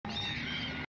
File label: Giant Mutant Hummingbird Roar